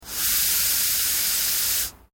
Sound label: MACHINERY PRESSURE VALVE RELEASE WEAK; Industrial machines